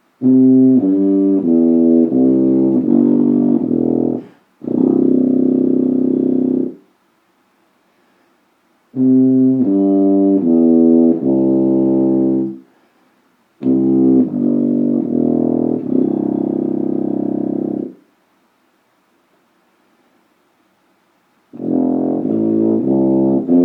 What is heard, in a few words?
Range, Kanstul 90 CC tuba